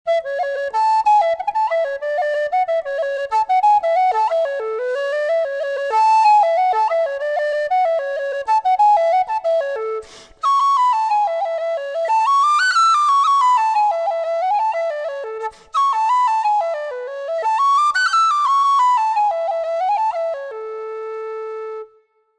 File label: Susato Kildare M in A